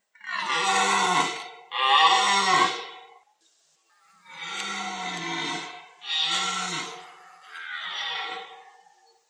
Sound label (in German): Hirsch; hirsch-wald